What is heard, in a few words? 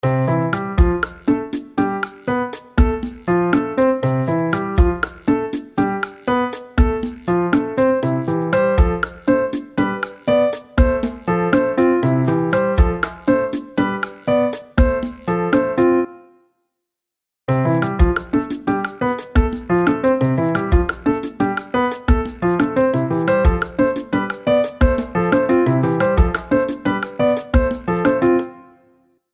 2 speeds